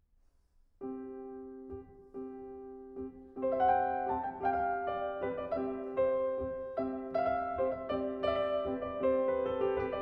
Leaping Dance, Piano; 25 Norwegian Folk Songs and Dances, Op. 17; Grieg

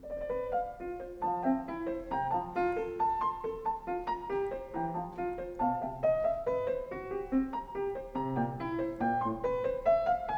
in F Major, Cello; 12 Variations on Ein Mädchen oder Weibchen from Mozart's Die Zauberflöte

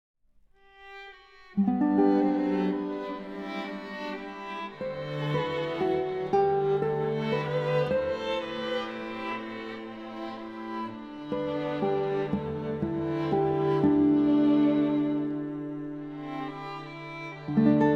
Arr. for Flute, Guitar and String Quartet; Carnival of the Animals The Swan

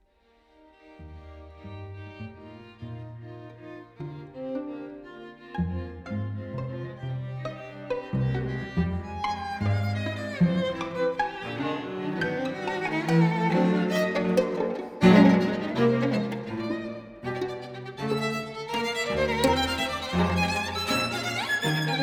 String Quartet; Anne-Sophie Mutter; East Meets West; Studie über Beethoven (String Quartet No. 6) III. Poco accelerando

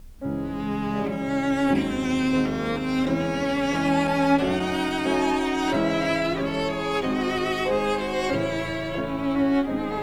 Piano Trio in A Minor, Variation IV, Cello

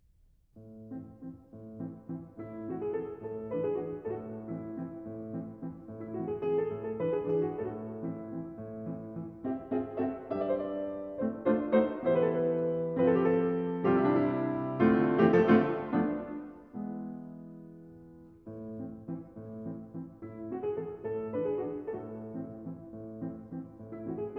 Vals Waltz, Piano; Lyric Pieces, Op. 12; Grieg

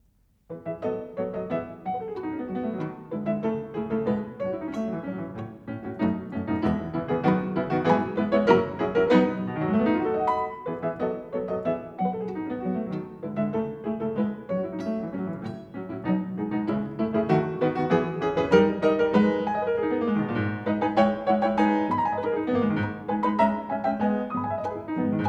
Piano Trio in A Minor II. Variation 3

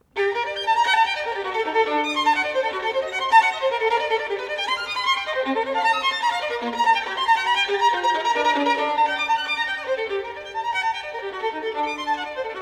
Caprices, in G Minor

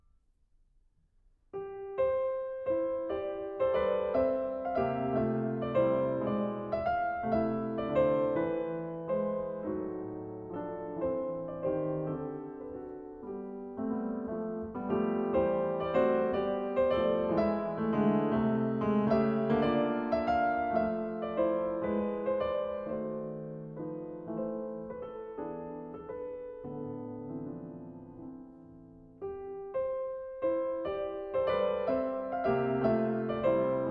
Wedding Tune, Piano; 25 Norwegian Folk Songs and Dances, Op. 17; Grieg

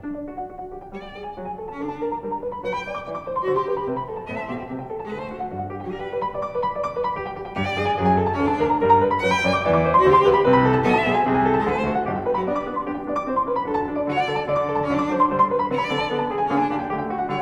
Variation, Cello; 10 Variations on Ich bin der Schneider Kakadu in G Major, Op. 121a Variation VI

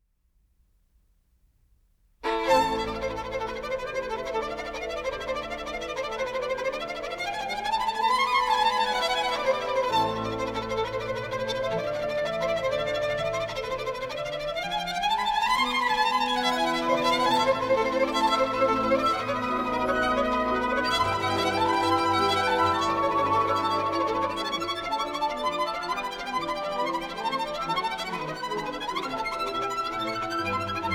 Suite for Violin and Orchestra in A Minor, Presto

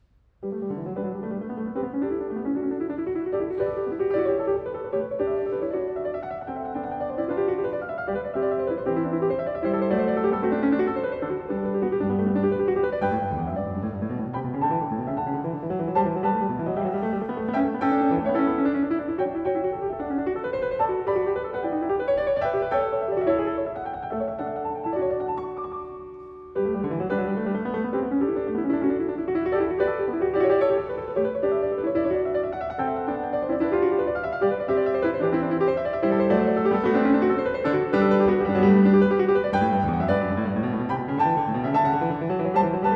Goldberg Variations Var. 26. a 2 Clav; Bach; BWV 988; Live at Carnegie Hall, New York, 2025

